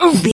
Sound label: voice male hurt pain